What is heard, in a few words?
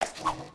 old man head spin; foley